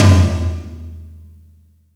floor Tom, drum Kit

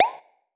emoji Pop Sound; avimoji

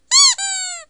bingo Squeak; bingo Card App

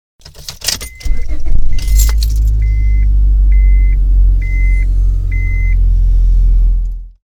start up car with seat belt warning beep

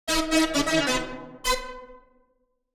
wave Complete; shortbow

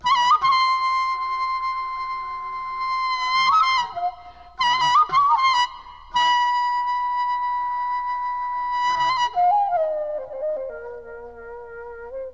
daegeum; Korea; chung sung gok

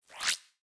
rezzing; voice Scope App

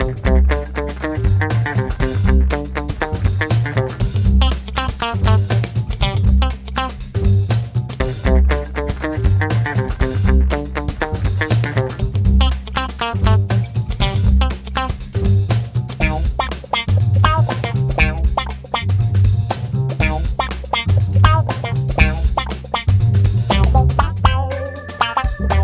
Upbeat Funk mono; music visualizer

